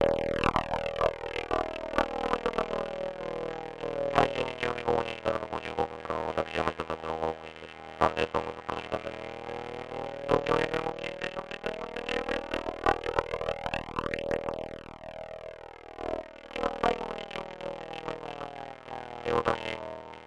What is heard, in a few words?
jet